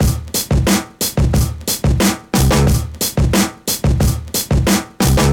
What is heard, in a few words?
Kit, Breakbeats